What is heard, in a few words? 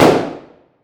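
weapon ar fire player atmo interior; gmod; viper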